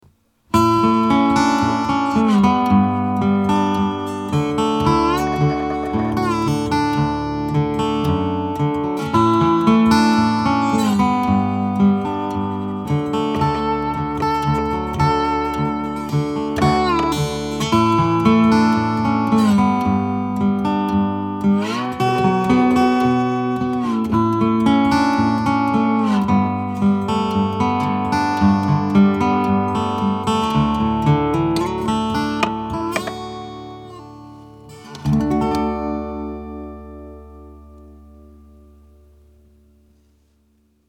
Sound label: Steelstring